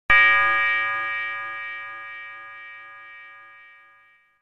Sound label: Grandfather's Clock